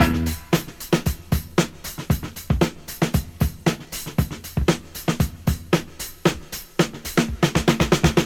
116 bpm modern drum loop sample e key

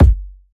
kick drum sample g key

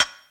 mellow rap hihat sample d sharp key; hat samples

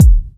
prominent kick sound d sharp key; kick drum samples

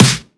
dirty acoustic snare sound c key

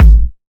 kick single hit g sharp key

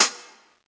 short reverb hi hat closed sound g sharp; g sharp key

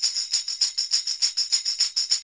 tambourine studio short loop; percussion

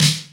90s snappy acoustic snare d sharp key; snare drum samples

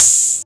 dampened open high hat sample a sharp key

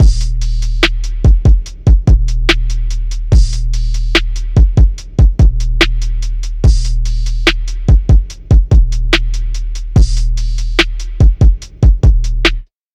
72 bpm drum beat d sharp key

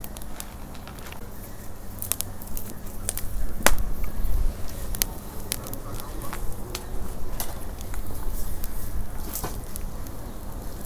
barbecue fire sound

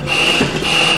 alarm blaring

